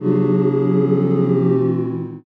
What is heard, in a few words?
commotion